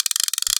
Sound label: reel, fishing rod